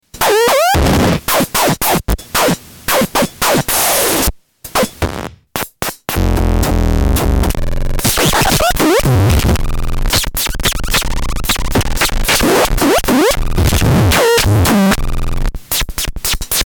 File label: bleeop